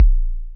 kicks, Bass Drum

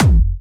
Medium, kicks

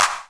Clap, Roland D-70, Drum Samples

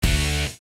Punk open; Nokia Ringtones; Audio themes

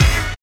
Stab

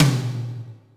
Yamaha RM 50 Toms

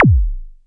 Roland SH-09 Bassdrum

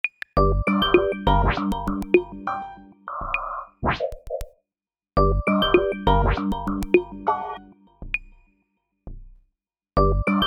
NOKIA ELECTRONIC, ringtone; Nova

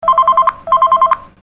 Ringring; Nokia Ringtones; N73

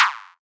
bombo, kicks, Hardcore

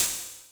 CYMBAL, Yamaha RM 50; Drum Samples; Cymbals

